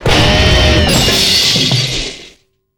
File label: Death